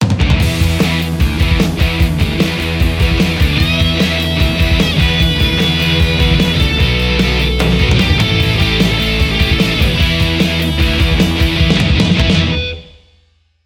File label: revolution z vintage; ronald